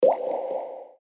kap zvuk kapayuschey vody

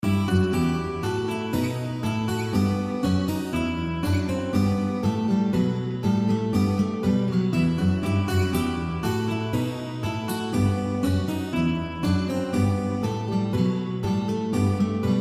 akusticheskaya sms horoshyy akusticheskiy zvuk na sms

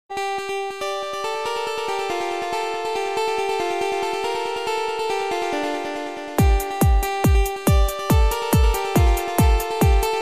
muzykalnaya sms sms melodiya

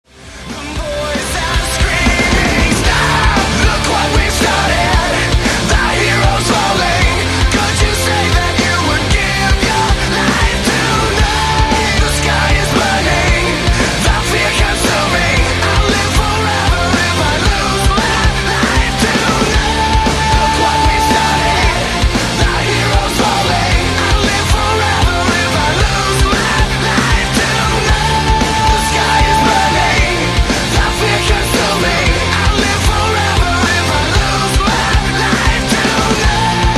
alternativnyy metal

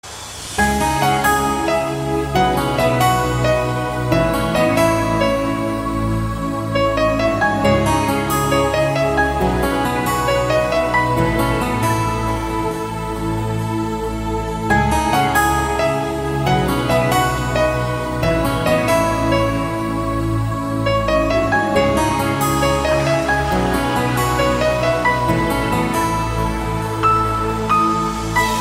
instrumentalnaya kompoziciya

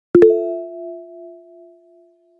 sms soobschenie na iphone; otlichnyy rington sms na telefon

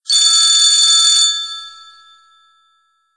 zvuki zvonov starogo telefona

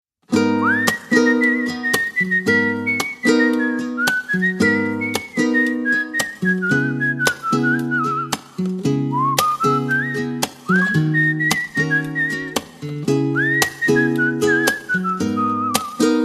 tureckaya sms nasvistyvaet melodiyu

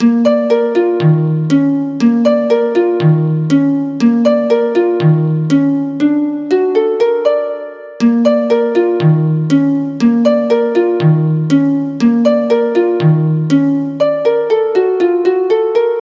dobryy prosto pozitivnyy elektronnyy motiv

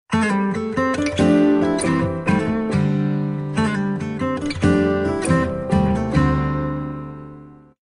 standartnoe sms soobschenie na htc gitara

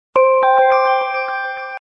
standartnoe sms soobschenie na htc kolokolchik

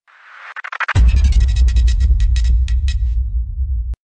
sms bomba; rington

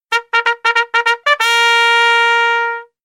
gorn sms

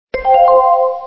sms zvuk

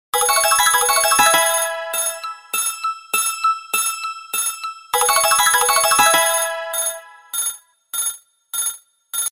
zvonok starogo telefona; uletnoe sms dlya vashego telefona

